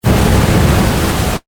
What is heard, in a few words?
Flame Booster Short, Burst; Long, Science, Fiction; Spacecraft Sound Effects